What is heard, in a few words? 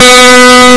Rock Guitar Heavy OD gtr H3